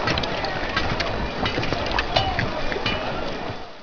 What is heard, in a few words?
clay